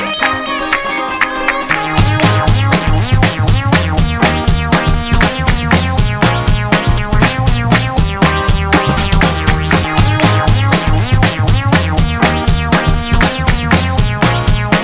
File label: lg g6 alarm ringtone